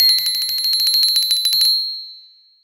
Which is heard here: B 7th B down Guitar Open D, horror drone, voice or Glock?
Glock